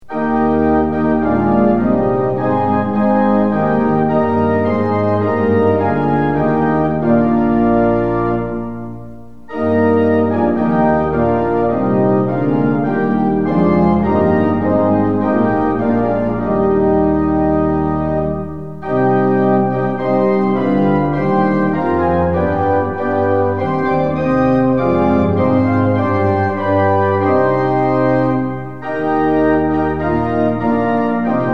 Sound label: tune; hymns; lillenas